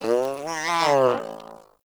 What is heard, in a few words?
Kitten Yawn; Warframe; Catbrow